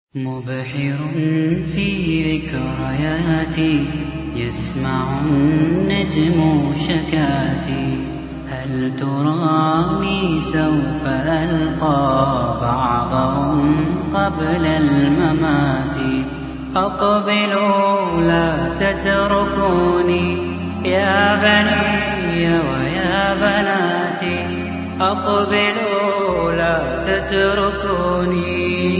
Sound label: Nasheed, ringtones